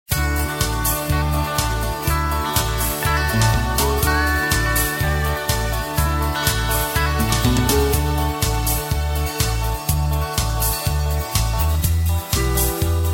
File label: music